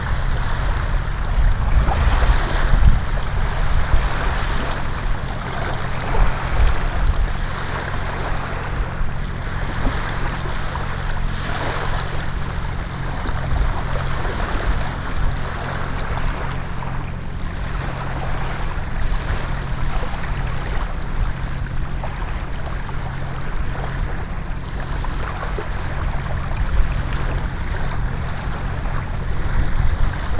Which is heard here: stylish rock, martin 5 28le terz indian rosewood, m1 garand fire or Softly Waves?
Softly Waves